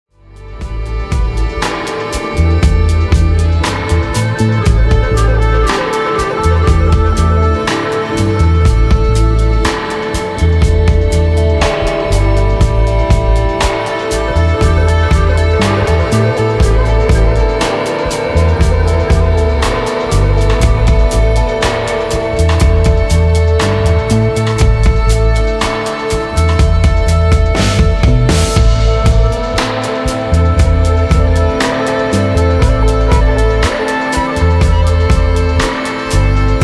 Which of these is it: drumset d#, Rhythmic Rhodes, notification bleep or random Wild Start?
Rhythmic Rhodes